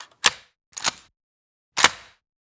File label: machine gun reload; Foley; DoomRTX